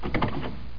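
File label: door wood close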